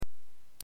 silent

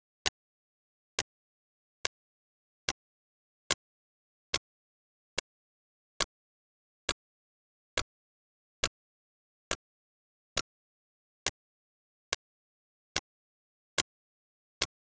sperm whale; sperm whales

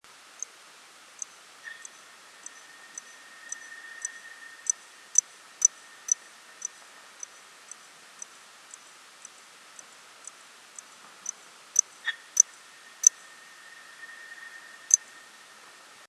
Dall's porpoise; porpoises

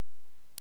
silent

